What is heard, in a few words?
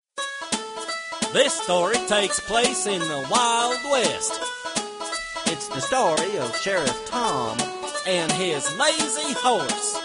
tom